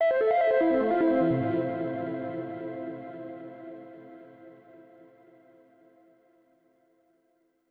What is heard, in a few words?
Overlay Tone